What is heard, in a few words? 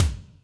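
kick Hi Wet Wsp, Alesis D4; 300 Drum Machines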